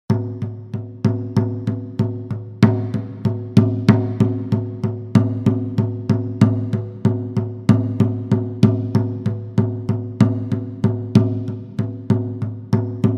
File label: groove; Kingdom Of Fantasy; Groove: Execution Drum 2